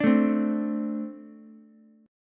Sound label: A Minor Double Flat 5th A, Ukulele; Ambb5 strummed; 7,9,8,x; JGuitar